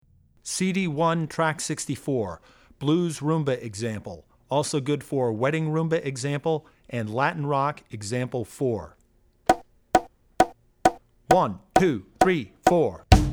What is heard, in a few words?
Blues Rumba; Drummer's Bible Tracks